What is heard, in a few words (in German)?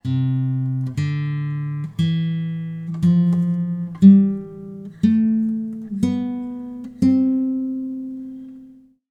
Die C-Dur-Tonleiter